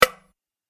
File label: dang, beat